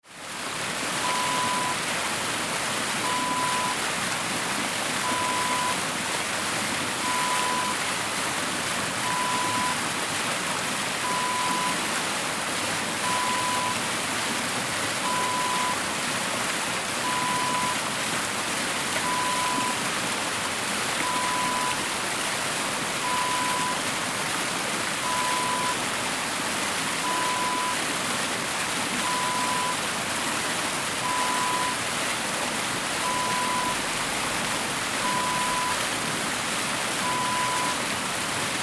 water fountain

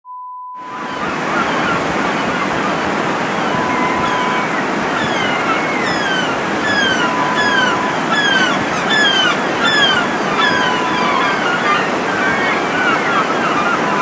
Beach sound

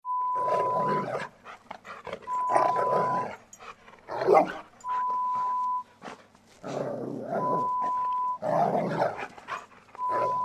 Dogs playing sound

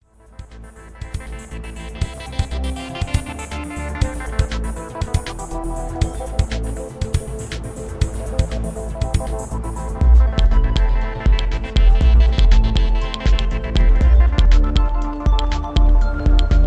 Ambiental Synth

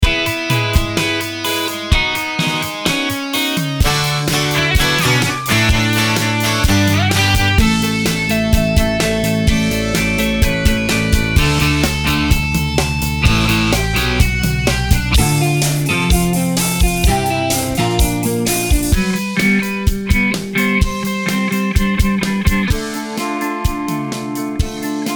124 BPM to 128 BPM

